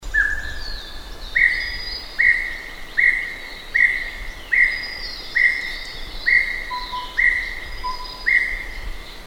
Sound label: Spot breasted Scimitar Babbler